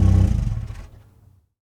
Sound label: Jeep Wrangler Off; Trucks